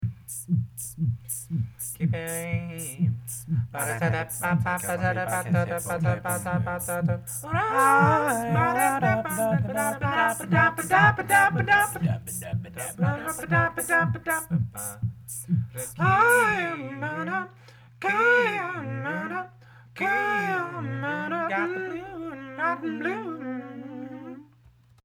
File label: Vocal